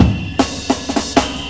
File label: break